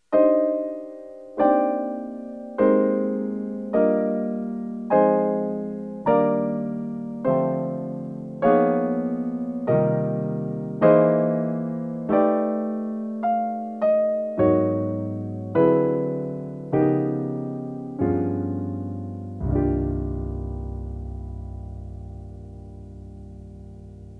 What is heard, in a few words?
fragment in c minor